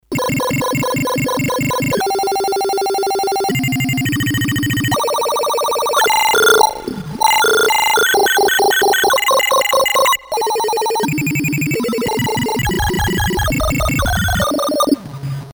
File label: 8BIT Alarm